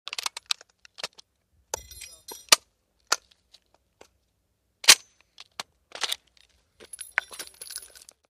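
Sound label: British Machine Gun Unload, exterior; british gimpy exterior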